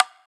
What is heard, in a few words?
TAMBY, Percs